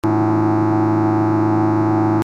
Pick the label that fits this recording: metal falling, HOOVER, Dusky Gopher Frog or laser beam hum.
laser beam hum